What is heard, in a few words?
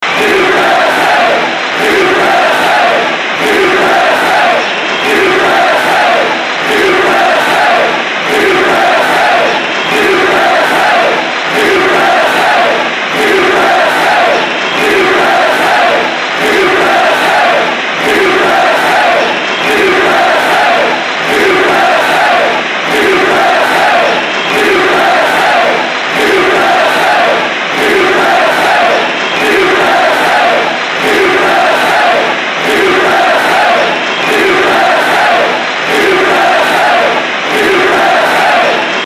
USA Chant